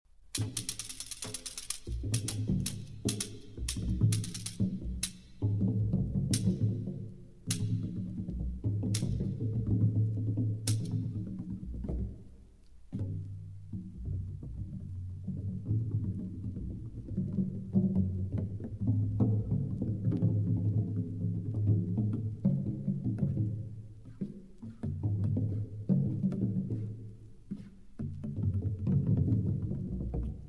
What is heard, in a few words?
Prepared Piano and Percussion Trio (Nine Tom-Toms, Pod Rattle); Amores